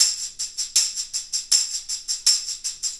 Tambourine; Sonic Foundry (Sony Creative Software) - Essential Percussion

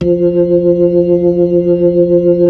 B-3, Club

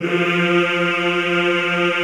Classical Choir, F2; Best Service; Extended Classical Choir

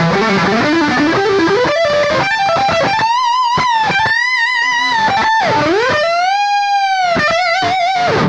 Solo in E, Guitars

